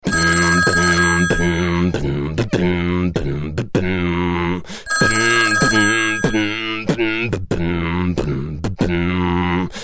beatbox melodie; ringtones; hip-hop

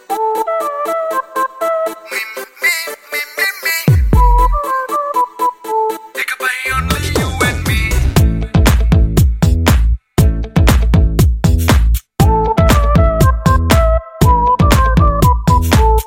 bgm; khaidi no 150

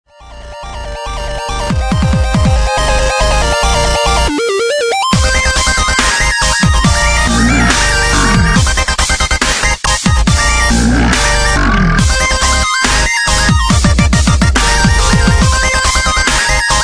dubstep remix; ice cap zone

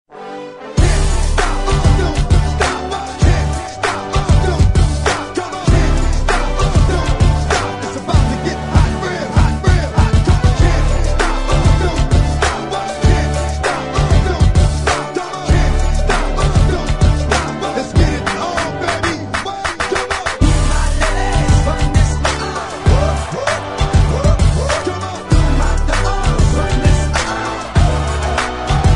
remix; indonesia raya

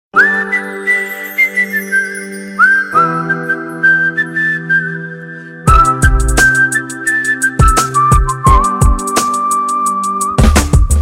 force whistle; ringtones; sms

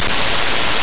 car alarm loud; ringtones

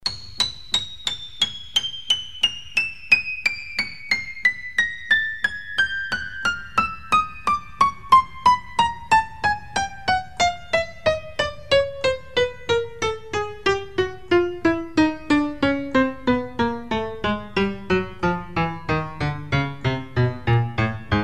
jumping piano

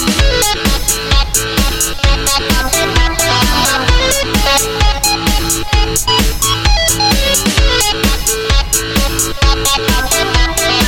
Rock And Techno

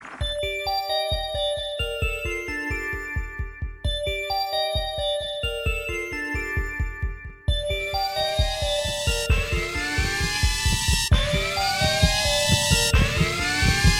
alarm

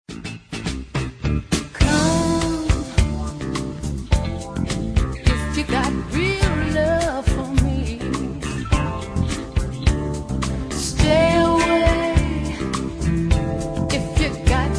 remix

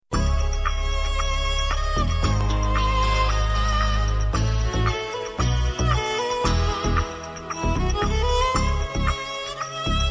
lovely violin; classical

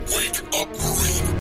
Nanosuit Alarm

